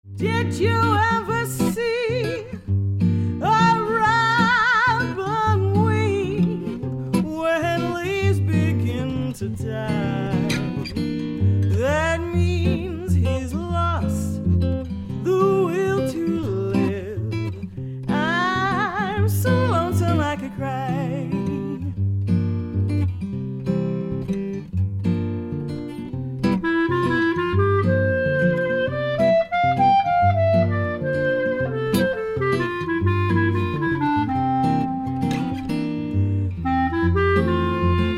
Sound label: Jazz Duo